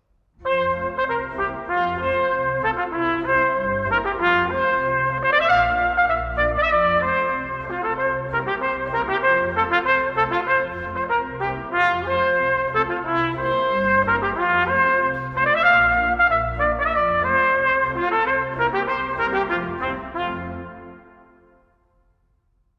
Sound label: Polka